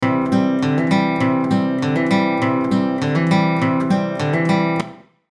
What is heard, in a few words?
AC Gtr Real Tile